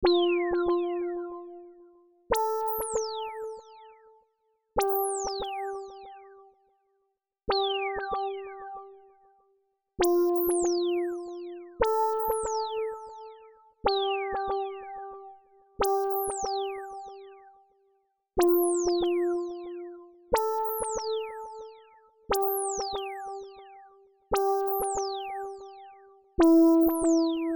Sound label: Synth